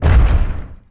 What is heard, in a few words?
bigfoot r; Starsiege; PC Gamer IT CD 35